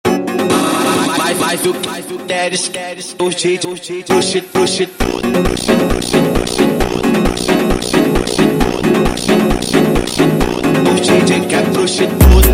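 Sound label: slowed, phonk music; funk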